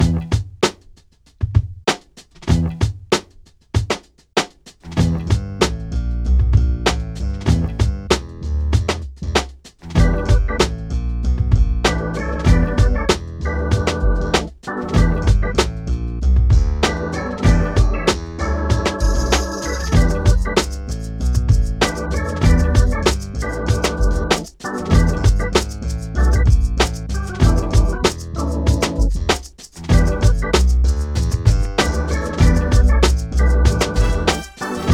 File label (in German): Funkrock cool